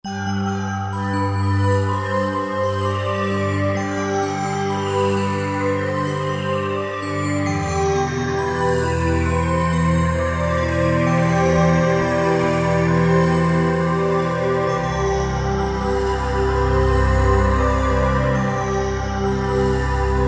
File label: Alpine Bells, Korg Wavestation A-D